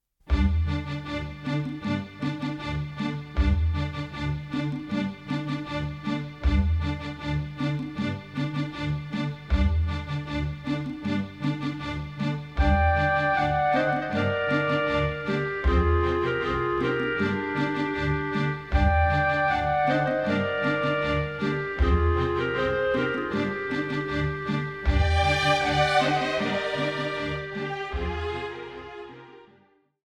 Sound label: Underground; SML